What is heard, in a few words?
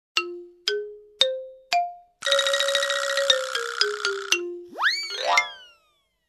Comedy Glockenspiel with spin